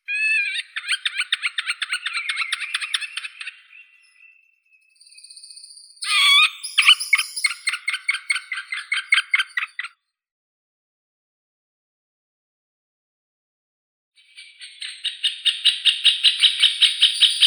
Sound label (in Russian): dyatel srednii pestrii dendrocopos medius